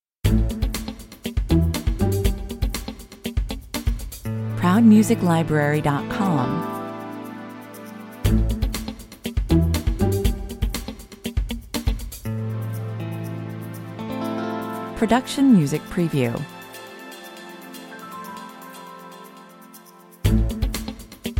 120 bpm, An upbeat, animated soundtrack with a fun, mischievous stop and go feel; Pizzicato strings, muted electric guitars and drums